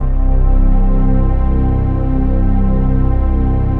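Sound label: chord pad, Pads; Best Service; XX Large - Pads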